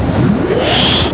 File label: portal close